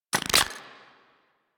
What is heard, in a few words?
Briefcase Launcher Rearm; Warframe; Weapons; Corpus